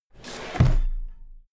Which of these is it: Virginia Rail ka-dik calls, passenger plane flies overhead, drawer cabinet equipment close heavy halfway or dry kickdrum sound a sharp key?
drawer cabinet equipment close heavy halfway